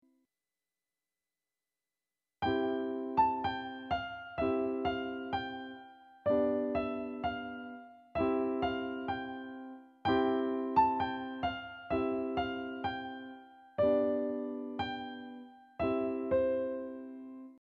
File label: London Bridge chords